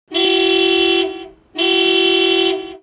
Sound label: car alarm loop